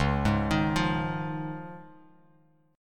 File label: Db Major Add 9th, Bass Standard; arpeggiated; JGuitar